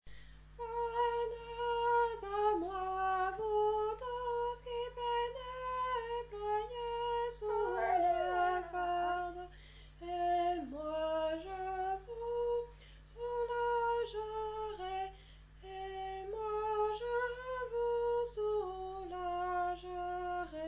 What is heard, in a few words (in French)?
Couplet 3 femmme; qui regarde vers Lui